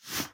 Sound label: mask on